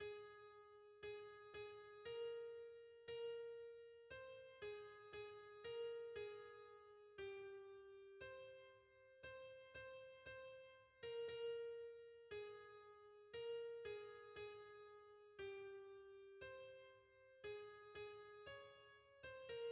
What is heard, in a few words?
soprano; Chantons les graces sans melange; hymns